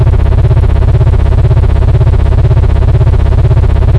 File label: core near; power struggle